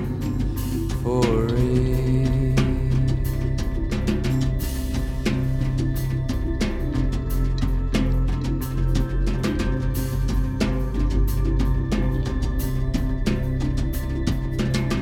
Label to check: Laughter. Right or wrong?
Right